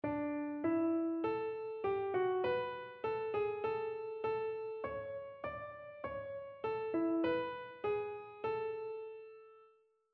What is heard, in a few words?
melodic error detection; ear training